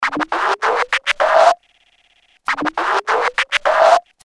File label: Vinyl Scratch; Apple GarageBand 2; Apple Loops